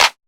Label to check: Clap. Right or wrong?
Right